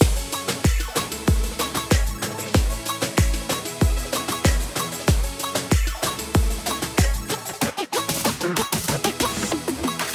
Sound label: Music Dance Party; barbie dreamhouse adventures